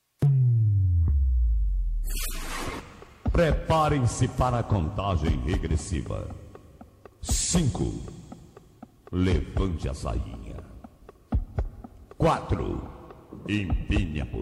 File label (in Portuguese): abertura